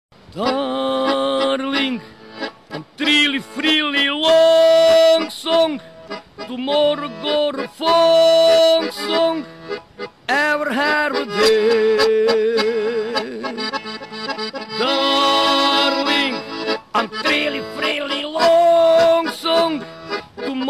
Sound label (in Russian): akkordeon